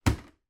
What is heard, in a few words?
transportation motorcycle helmet put down on surface